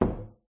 phone drops on wooden table; your free sounds

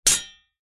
warfare swords hit single strike

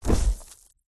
thud impact gritty debris

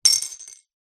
bottle tops beer metal caps x2 down on table; zapsplat

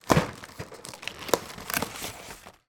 box chocolates open; sound effects one

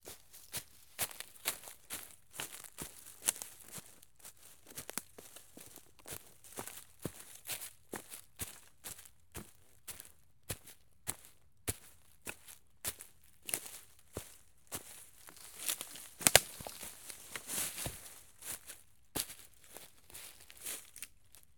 foley footsteps woodland forest walking various; fork media

